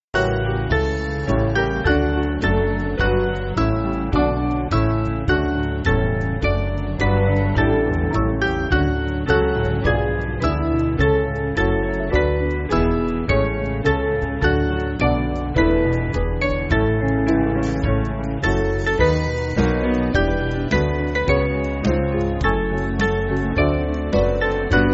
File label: Band, church music